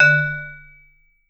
D2, Vibes; Sampleheads; Dave Samuels; Marimba & Vibes

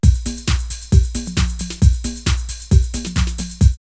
Beat 134, drumloops Techno House; MoskoBeat; X-Static Goldmine

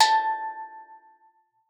steeldrum A4; Xsample VOL-4

